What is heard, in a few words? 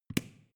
switch small turn off hard